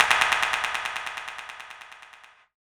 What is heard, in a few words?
CLAP FX